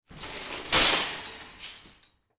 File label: supermarket trolleys carts hitting each other